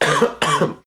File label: Sick Healthy Coughs Cough